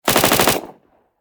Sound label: Submachine Gun Thompson 9Mm Long Burst Distant Perspective; Warfare; Machine Guns